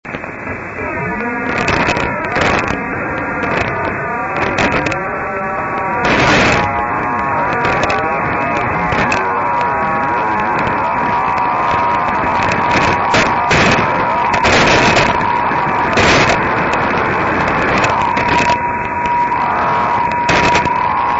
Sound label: 472.5khz